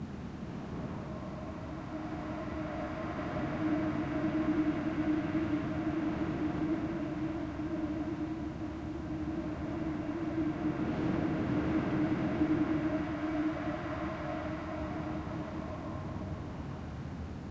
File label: corridor, undeadtower